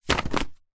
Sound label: household mail bills greetings cards pile land floor post; zapsplat